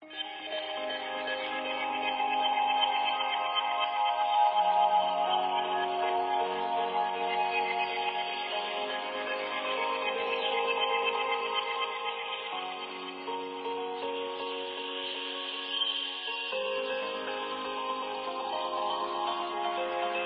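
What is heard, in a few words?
Music Ambient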